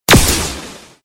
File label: object explode; Quicksilver